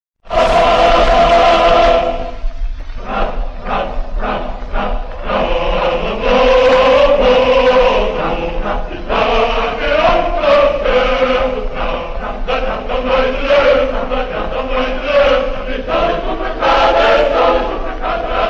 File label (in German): jger marsch